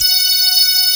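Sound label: synth F#6; Best Service Gigapack 1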